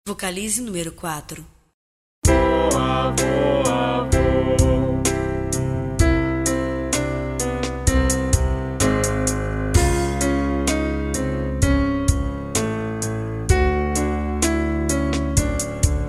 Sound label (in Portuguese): sem voz, vocalizes canto; exercicios canto